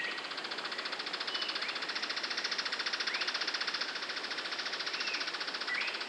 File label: Philydor novaesi rattle after play-back; 700 m RPPN Frei Caneca Jaqueira-PE